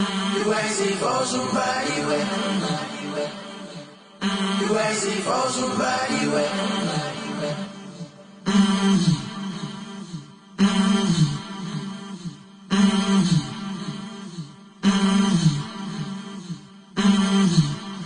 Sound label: amapiano; afrobeatz